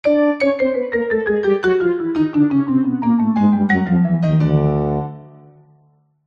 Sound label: cartoon running down descend musical piano tuba marimba